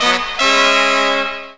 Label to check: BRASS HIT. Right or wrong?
Right